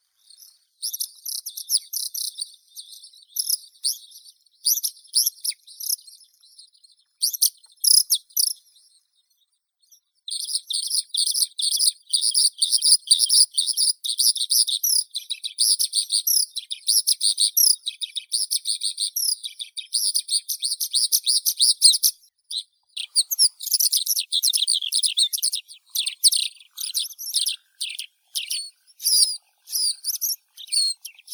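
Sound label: oriental skylark, Alauda gulgula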